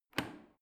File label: washing machine creaky button press in; haus garten; haushalt